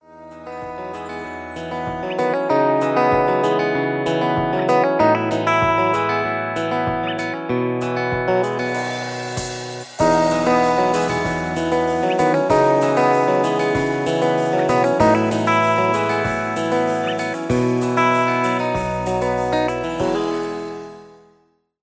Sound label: country ringtone, nokia ringtones